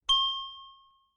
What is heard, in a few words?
open